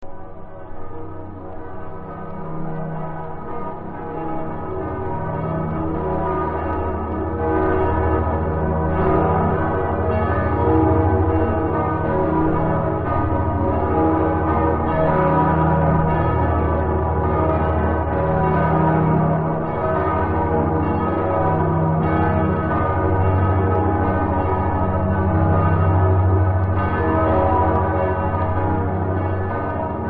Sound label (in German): Glocken